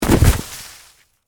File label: Body Fall Snow; Horror, Body, Fall, In, Snow; Blood and Guts; BlastWave